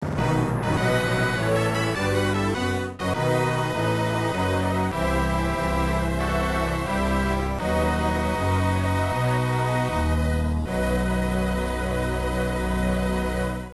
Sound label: Last Round Clear; warriors of fate arcade